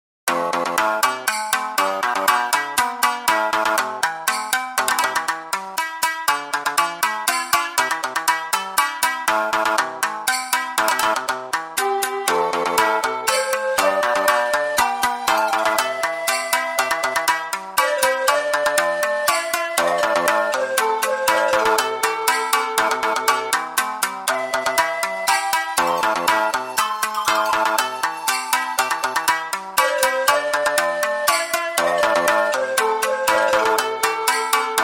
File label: Japanese Folk Style, Shamisen, Shakuhachi, Pan Flute, Percussion; Sleigh Bells; ShamiKoto; Syntheway